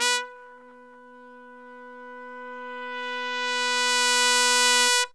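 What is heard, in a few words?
trumpet tenor sforzando; Zero-G; Phantom Horns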